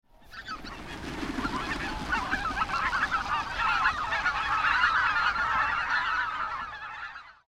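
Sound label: Greater White-fronted Goose; マガン; 羽音; 宮城県伊豆沼; izunuma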